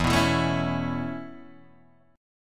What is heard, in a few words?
E 6th Suspended 4th; E64 Chord {0 2 2 2 2 0} strummed